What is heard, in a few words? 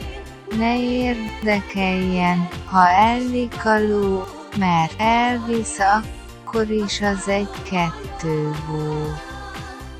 Moderatly Funny, Jingle; 12Go Jingle; ONE2GO Repülőtéri- és privát transzfer